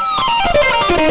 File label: xtra lead